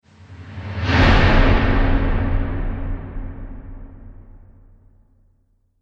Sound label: Cinematic Impact; Miscellaneous Impact Sound Effects